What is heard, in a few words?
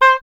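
oboe, wind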